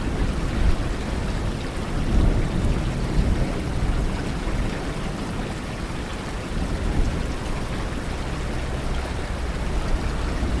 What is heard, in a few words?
Atmo